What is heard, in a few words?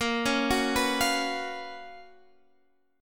Gb Major 11th Bb, chord